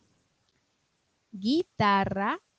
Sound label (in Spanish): guitarra